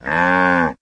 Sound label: Cow Scream; Farm Mania 2